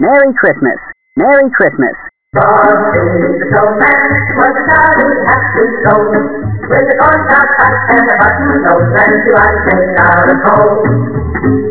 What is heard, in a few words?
Merry xmas , Human singing song